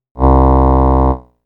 Nasal A, synthlead

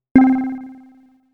Vibra, synthlead

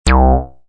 Sweep bass

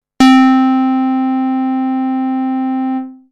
Basic Pulse, synthlead

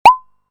Wasp-Drum; drums

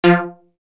Steeldry

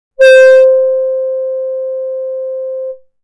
Basic Pulse, synthlead

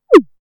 Little Bird

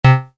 Triton, synth lead

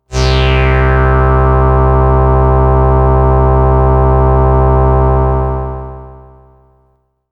Sun-Sigh E, synthpad

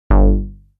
Plasma-Bass 098Hz

